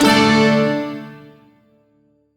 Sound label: Shawzin Chromatic Chord; Warframe; Duviri